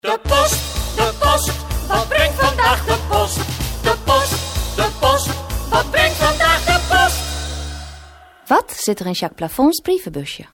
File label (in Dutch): jingle